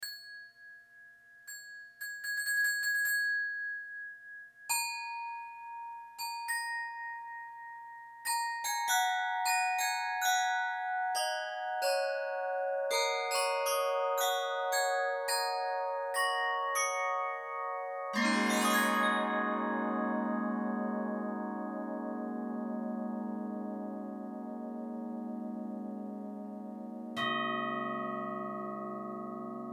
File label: Windchimes Using CMS